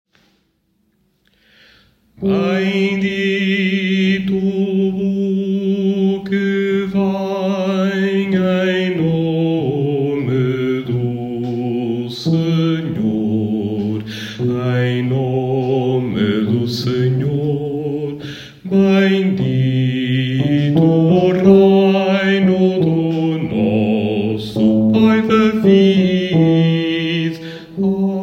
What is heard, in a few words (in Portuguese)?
Versículo Aleluia Baixo